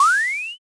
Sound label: vanish